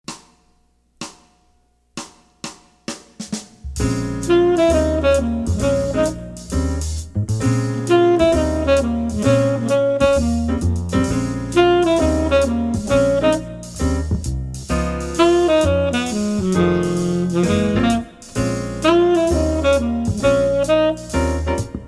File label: Swing O Rama, Trumpet; 15 Easy Jazz Etudes; CM Level 3 Solos (jazz)